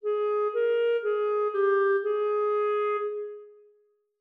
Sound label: 5 notes F min